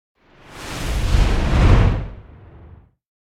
Whoosh Fat Storm; Hollywood Edge; sound designer's toolkit v2

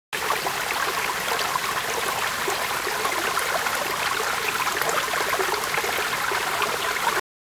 water stream medium

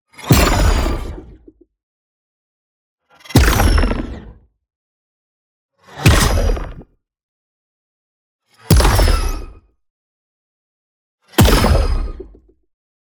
NEON HIT LARGE; Booster; boom library

